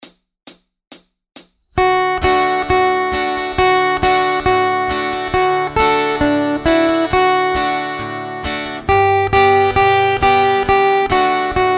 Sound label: SLOW, guitar; no fingerstyle; Jingle Bells; 1 MINUTE MELODIES STARTER